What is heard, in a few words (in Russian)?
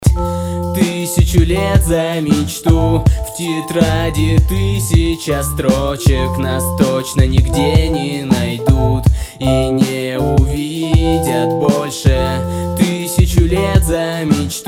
vokal